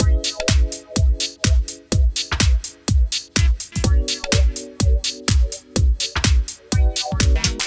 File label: House